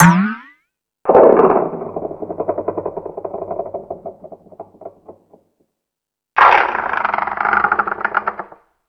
Tool Kit, Drum Machines

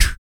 VOC PERC, Vocal Planet Jazz FX BASS PERC

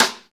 snare, Roland; Club-50 - Foundations Roland; Ext.Snare 2

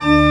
CHRCH, CHURCH ORGAN; Roland - LA Composer vol. 1

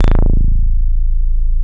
DIGI BASS; DIGI BS

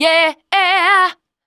VOC F, Dance Vocals, FEMALE

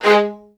XST1 G#3, LOUD VIOLINS; Q Up Arts; Denny Jaeger Master Violin Library

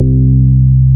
BAS MKS-50, Roland; Sound & Vision - Gigapack II CD 1

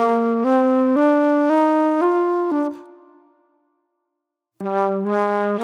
Flute Noir; Sonic Foundry (Sony Creative Software); Manhattan Flutes

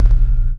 Synth OneShot; Sonic Foundry (Sony Creative Software); Rhythmicronics

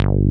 303 bass; Pro-Rec - Synthsonic; BASSES

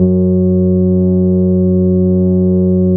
SLAP F#3, Bass; E-MU Producer Series Vol. 6 – Analogia Project (CD 1); Boom da Bass 1

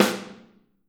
Snare OH; Snare OH All; Drums Percussion

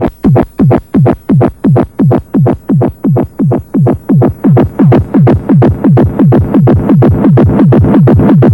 Lo Tek Impact, Weird; Industrial

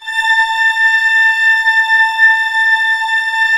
ORC A5 FF, Orchestra; Sound On Sound - Peter Siedleczek's Orchestra

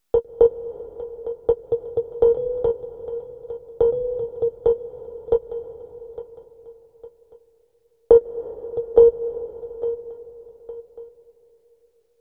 Ambient Rhodes; Sonic Foundry; Cinematic Funk Urban Tone Poems; Master Zorn

